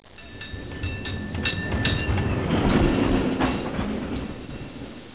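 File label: cable car; 12000 Graphics Pack - A Complete Graphics Collection For Your PC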